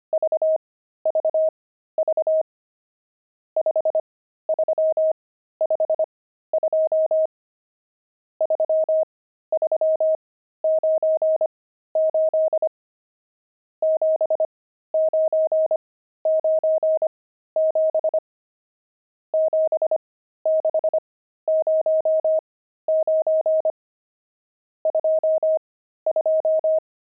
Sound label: pangram, intermediate pangrams files